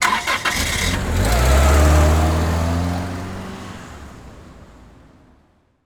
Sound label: escape car; teardown; ps5 windows xbox series xs